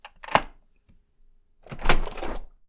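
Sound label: open back door, 裏口ドアから出る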